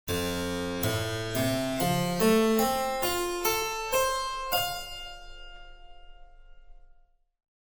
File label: harp